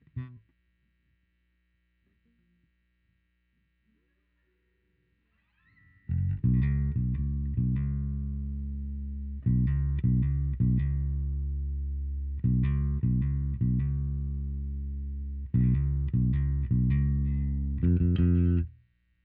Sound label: new Bass